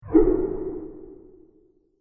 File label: High Short And Fast Science Fiction UFO Transition; Science Fiction Sound Effects; CraftedSignals